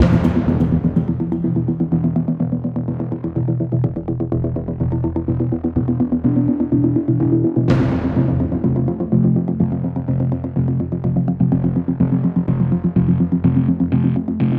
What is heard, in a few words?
Music - Long Range Combat Loop; Smashy Road Arena android ios 2016